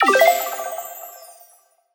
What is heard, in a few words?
Collectibles Classic FX; Video Game; Cymatics; Video Game Sample Pack Vol 1